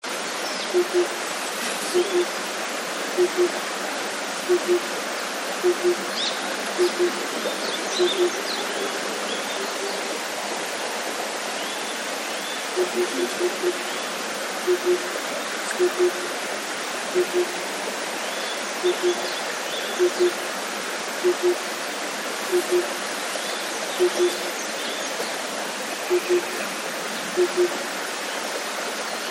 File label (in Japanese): tsutsu